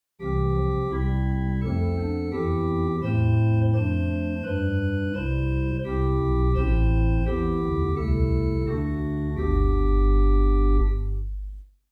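Swell Rohr + Nazard + Tierce Great Bourdon Pedal Bourdon + Great; First Baptist Riverside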